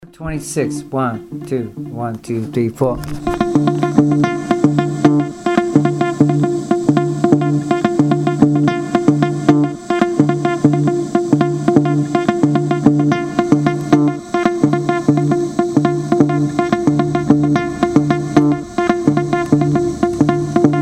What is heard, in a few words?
mozambique patterns